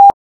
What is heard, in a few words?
Morse E